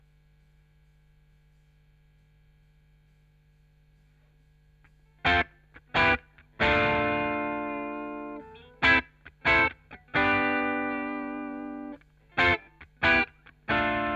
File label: Chorus Gtr